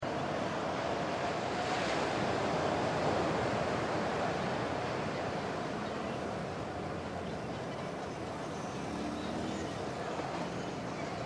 MALECÓN DE PLAYA EL SILENCIO DESDE ARRIBA Y CON MÚSICA DE FONDO

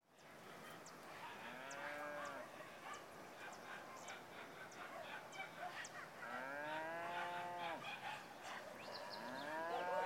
CAMPO ATARDECER VACAS Y PERROS LEJANOS RÍO AL FONDO

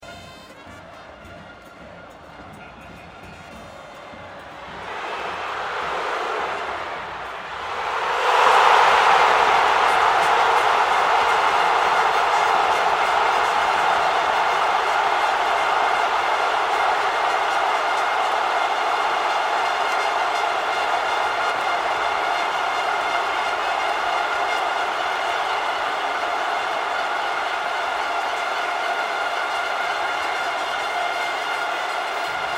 PARTIDO DE FÚTBOL GOL PERUANO; PERÚ VS ECUADOR

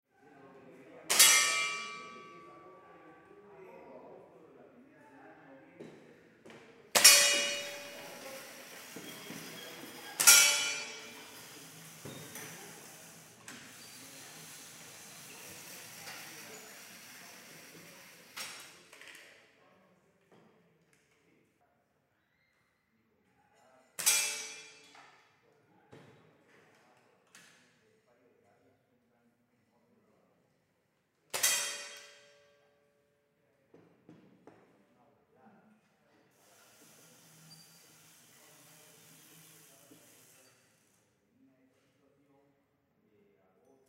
SALA DE TIRO ENTRENAMIENTO

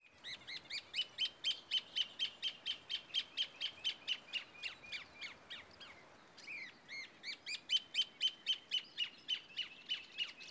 PÁJARO EN ÁRBOL DE ALGARROBO

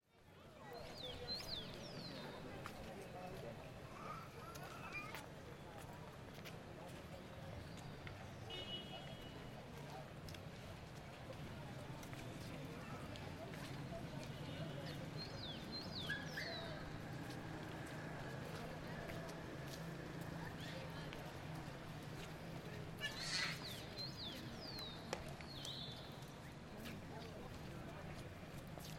PLAZA CENTRAL LAMBAYEQUE AMBIENTE GENERAL

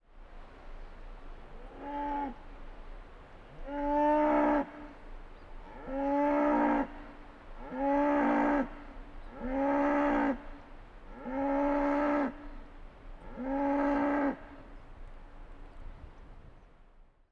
VACA MUGE EN VALLE ANDINO

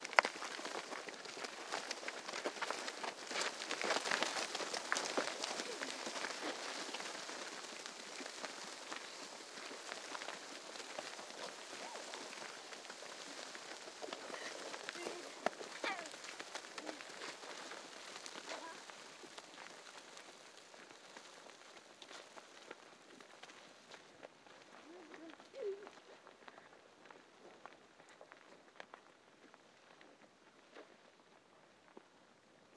GANADO Y NIÑOS PASTORES PASANDO POR ALTIPLANO

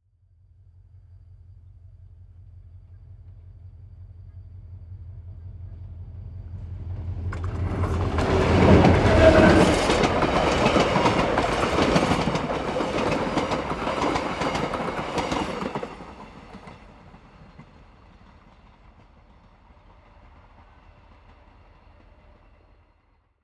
TREN PASAJE ALTIPLANO SIN SILBATO

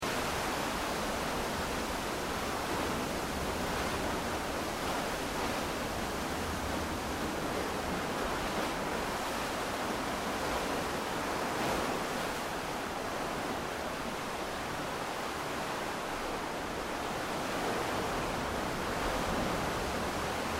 BOQUERÓN DE PUCUSANA CON GRABADORA DE MANO

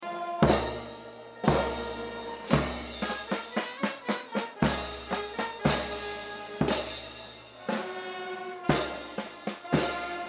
PROCESIÓN BANDA POLICIÍA NACIONAL; SEÑOR DE LOS MILAGROS

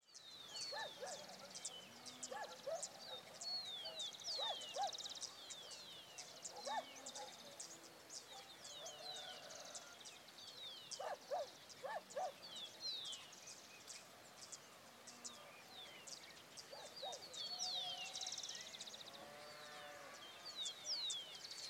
CAMPIÑA CAJAMARCA PLANO GENERAL PÁJAROS Y OTROS ANIMALES LEJANOS

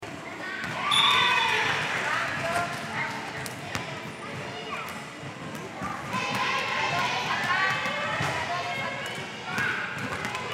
POLIDEPORTIVO ENTRENAMIENTO DE BASKET NIÑOS; PUCP

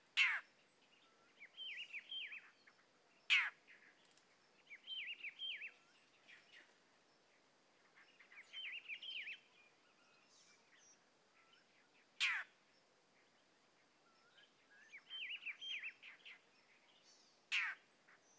PÁJARO EN SANTUARIO MANGLARES DE TUMBES

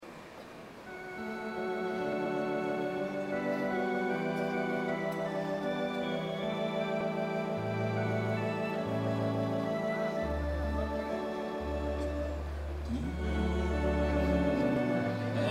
CANCIÓN PESCADOR DE HOMBRES EN MISA

